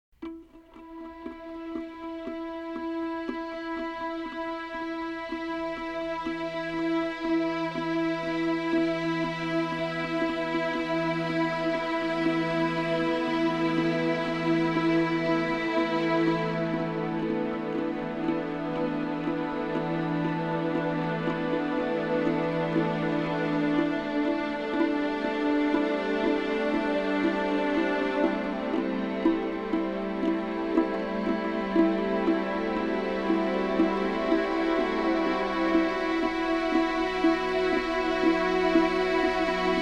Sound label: Plucking String Drone, Atmospheric